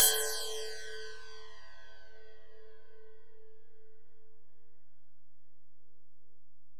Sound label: Ride, Alesis DM-PRO